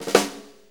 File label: Blues Snare Flam; Sonic Foundry (Sony Creative Software); Loops & Samples Drum Tools; Flams